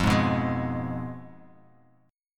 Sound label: F Diminished F down Bass Standard; Fdim strummed; JGuitar